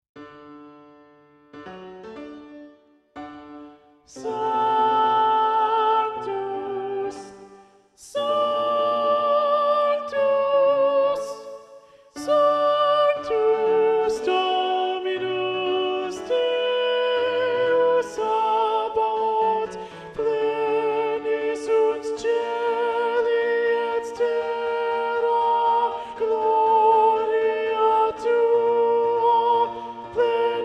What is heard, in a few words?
Alto; Mass No2 in G Mvt 4 Sanctus; Practice Room